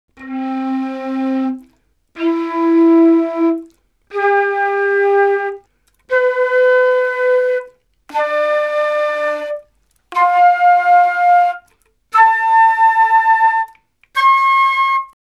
Salsa Picante; Masterbits; World Zone VOL-3

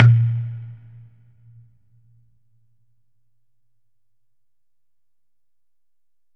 COCONUT WET; Q Up Arts; Denny Jaeger Private Collection

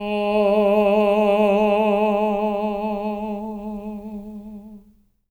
TENOR G#3; Spectrasonics; Symphony of Voices

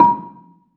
BELA A#4, BELAFON, Asian Traditions; USB Soundscan vol.60; AKAI

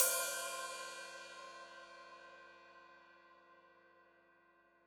Hat Open, Hi-Hat; Deeply Sampled Drums; Cymbals Stereo

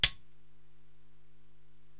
HH Metal Ringer, Korg TR-Rack drum machine

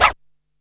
DR-660 Perc, Drums, Boss DR-660

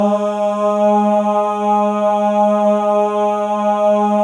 MALE AHS, Voices; Spectrasonics

